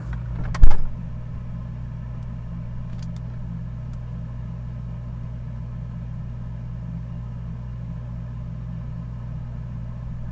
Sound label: sound inside my refridgerator